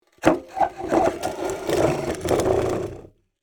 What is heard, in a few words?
Metal fence short squeaky movement; squeak sound effects